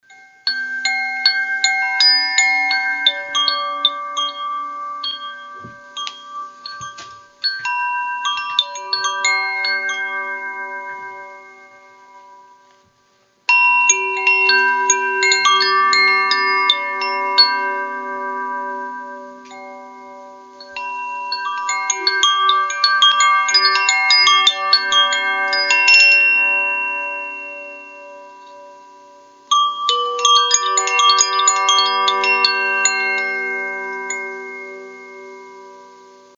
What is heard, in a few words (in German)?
Ignis Klangprobe